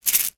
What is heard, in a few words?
bath sprinkles shake short; zapsplat